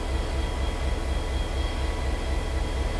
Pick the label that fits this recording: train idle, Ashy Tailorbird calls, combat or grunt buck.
train idle